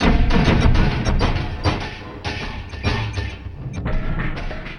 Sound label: Elektro Dip, Drums; Sonic Foundry (Sony Creative Software); Friendly Fire